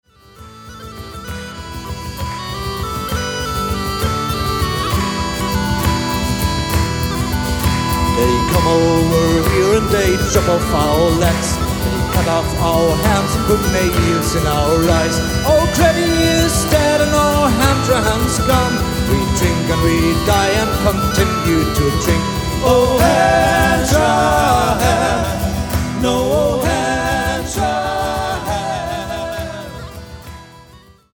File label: irish folk song